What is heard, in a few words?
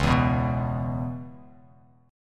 Db Minor 7th Db, Bass Standard; strummed; JGuitar